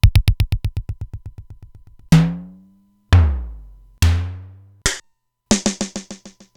Simmons SDS-9; Factory D3

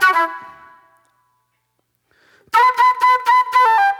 Soul Plate Flute; Sonic Foundry (Sony Creative Software); Manhattan Flutes; Session 03 - Classic Caddy

